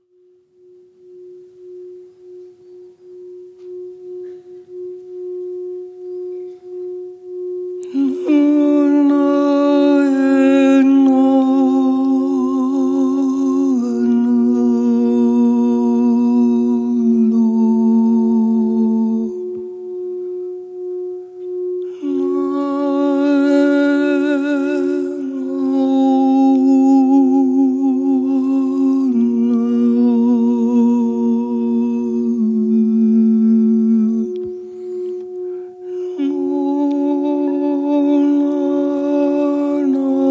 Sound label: Lullaby